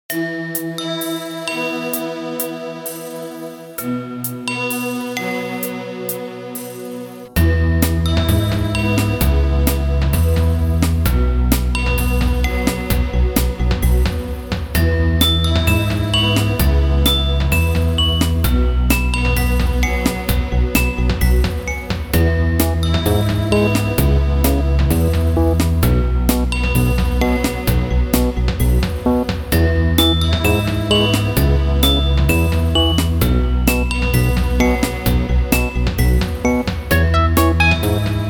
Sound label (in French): VERSION KARAOKE; Alex et Zoé 3